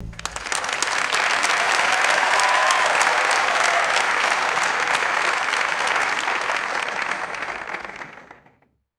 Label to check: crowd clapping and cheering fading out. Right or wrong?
Right